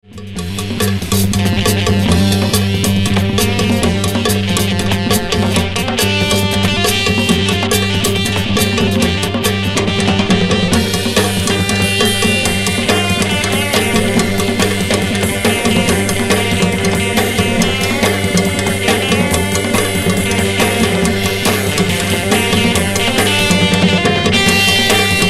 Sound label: Electric Latin Soul